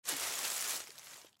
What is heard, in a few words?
Foliage Movement Sticks Leaves Short; Forests and Jungles; Blastwave; Foley